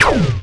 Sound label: ray gun